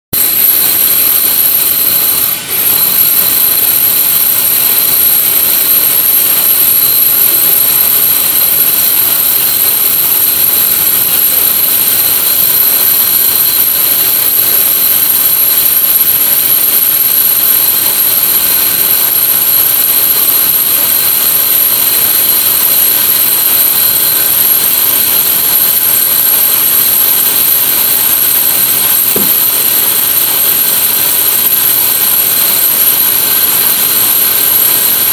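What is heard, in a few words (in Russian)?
звук перфоратора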